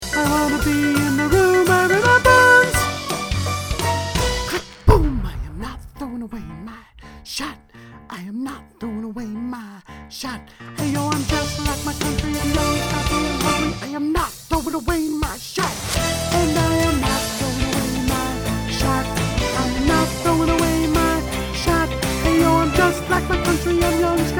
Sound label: alto